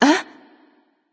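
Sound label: surprised, female; smite; voicepack; hera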